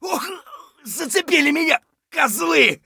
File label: hurt light; Escape from Tarkov; scav1